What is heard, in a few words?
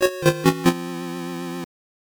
Death; Bartman Meets Radioactive Man; Game Gear